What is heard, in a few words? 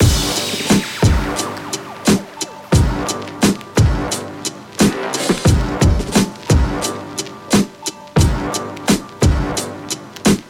HIPHOP bpm88